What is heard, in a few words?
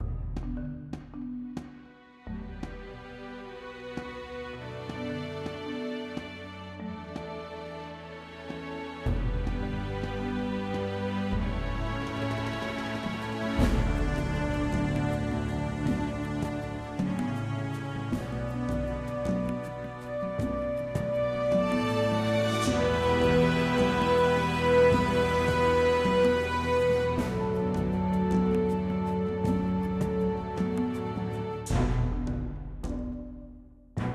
loading screen; ghalia; Legends of Aethereus